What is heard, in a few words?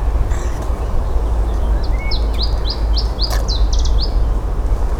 ibe song